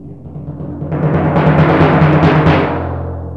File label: TIMPANI